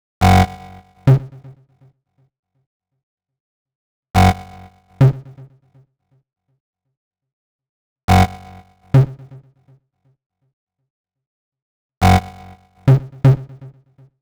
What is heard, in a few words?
122 B#m Bass, Complex Electro Synths; Prime Loops; Specters